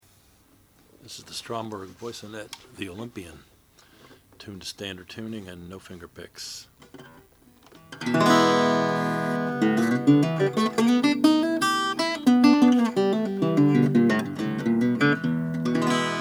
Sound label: stromberg voisinet the olympian natural